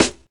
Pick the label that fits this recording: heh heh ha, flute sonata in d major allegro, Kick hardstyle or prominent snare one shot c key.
prominent snare one shot c key